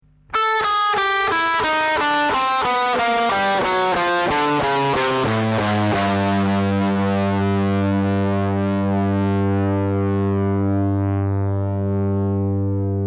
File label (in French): disto gamme